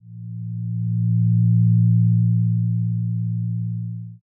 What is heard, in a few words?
zero g